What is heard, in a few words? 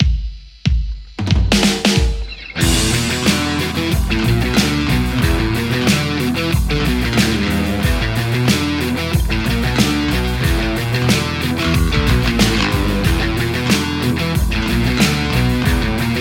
Les Paul JCM800 hot; mercuriall